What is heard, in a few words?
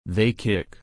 kick